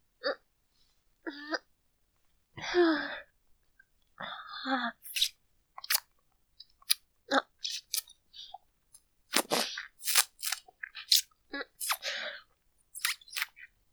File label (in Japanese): ひたすらキス